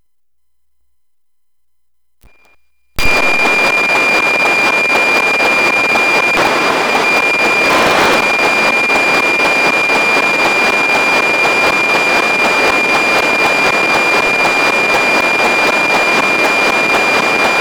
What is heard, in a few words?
NOAA17 Closest Approach, Satellites